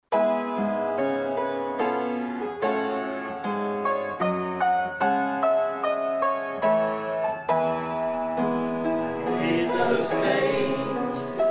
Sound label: hymn